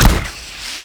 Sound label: tarantula rocket fire